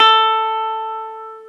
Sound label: Gretsch A4